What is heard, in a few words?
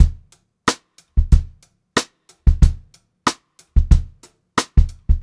Funk-Groove Drumloops